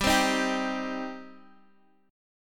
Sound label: G Suspended 4th Sharp 5th G